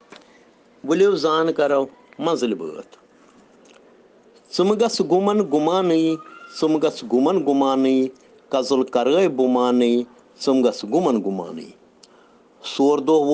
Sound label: Children Rhyms in Kashmiri